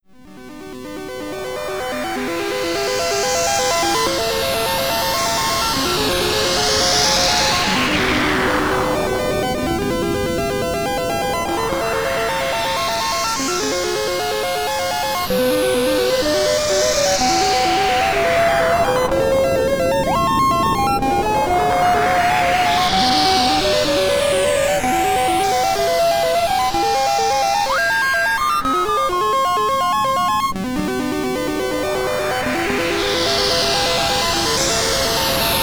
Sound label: SID Stereo; Ghosttown; Commodore 64